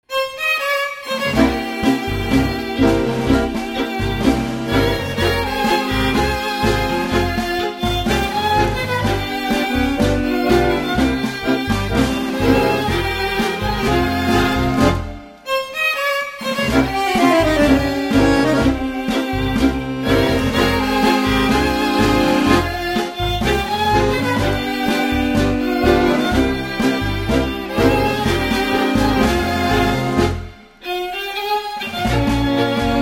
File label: car tango